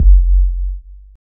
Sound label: long tail reverb kickdrum F sharp key